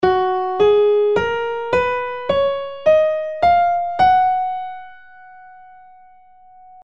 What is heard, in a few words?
Scale of F Major